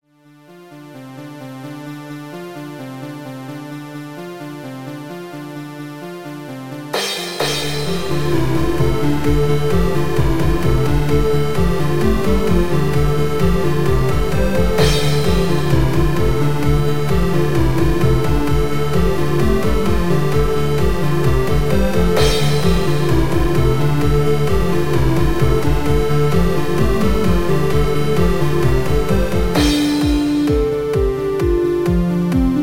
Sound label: Chiptune Test